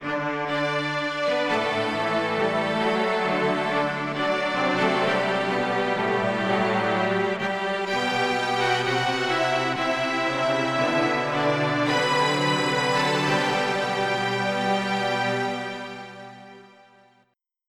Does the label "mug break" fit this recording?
No